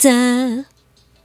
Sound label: Dah B, Mellow Women